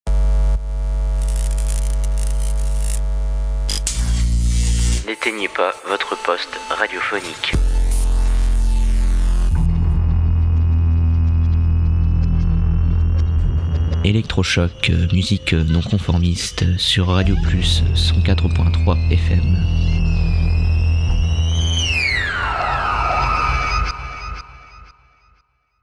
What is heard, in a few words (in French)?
jingle electro choc radio